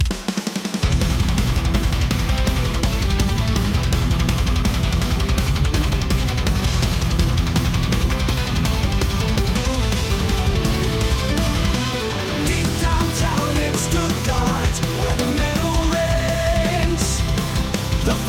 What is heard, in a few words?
Metal Karaoke